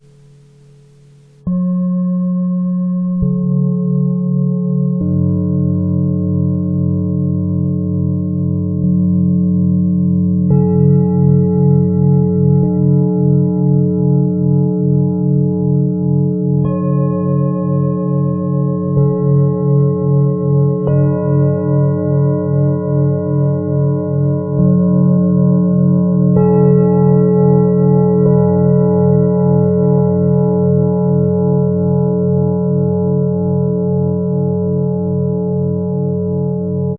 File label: washed our three bowls hard striker